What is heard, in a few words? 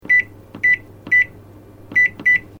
MICROWAVE SHARP BEEP SEQUENCE; Microwave oven; Kitchen; Household